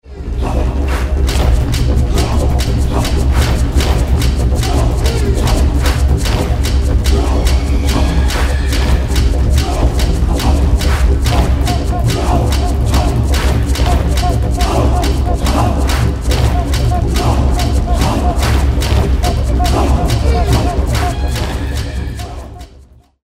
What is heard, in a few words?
DIDGERIDOO trip